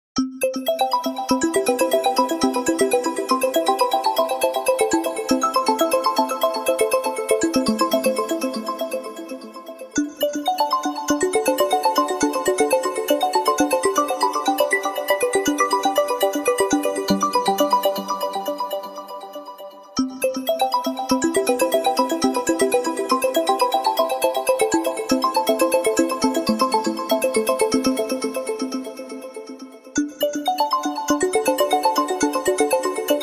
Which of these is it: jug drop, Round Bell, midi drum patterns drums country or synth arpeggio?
synth arpeggio